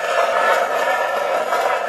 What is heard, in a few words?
metal grenade scrape smooth loop